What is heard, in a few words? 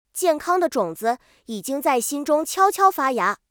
ending